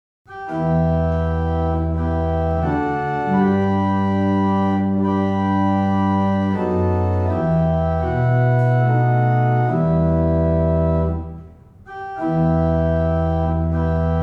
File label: Single chant, barrel organs; Easton; Blow